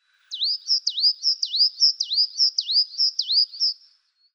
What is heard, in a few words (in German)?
Lasurmeise Parus cyanus